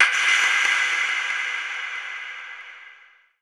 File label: TECHNO FX 140